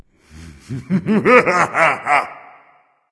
laugh; smite; anubis

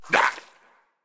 hitreact small; minion s8; smite; npc; roman archer

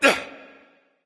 grunt attack bow; smite; voicepack; ullr

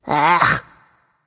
ugh; smite; voicepack; thanatos

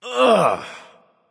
"ugh"; smite; merlin

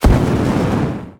space jetpack intro; smite; Anhur

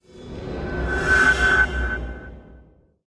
death tail; smite; chronos

